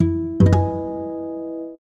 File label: Messenger Strings; GPSMAP H1